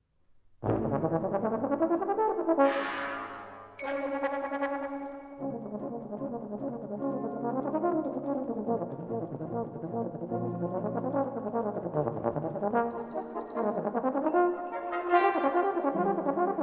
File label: Elves Dance